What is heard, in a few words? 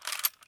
weap bolt handle out; Escape from Tarkov